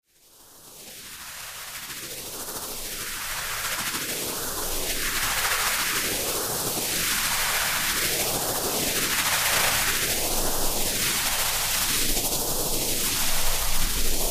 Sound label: white noise phased